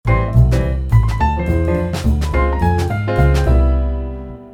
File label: C4 tritone sub maj7